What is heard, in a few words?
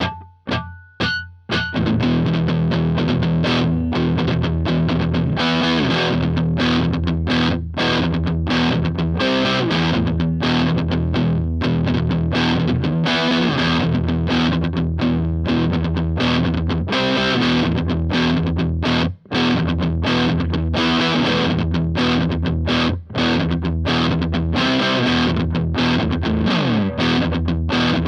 Gibson Les Paul Studio Neck